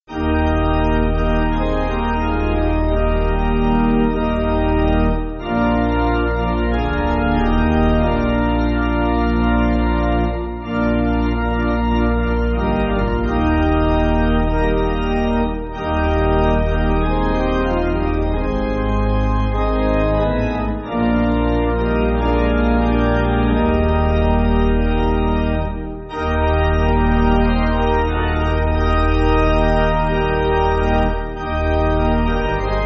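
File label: Pipe Organ, Music Backing; Ere You Left; Perkins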